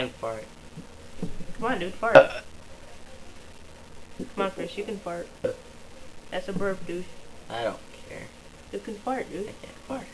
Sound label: fart